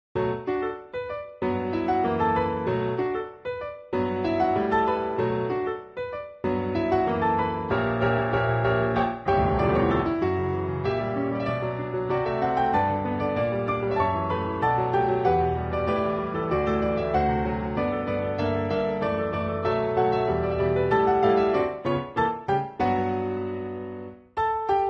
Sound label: piano ver.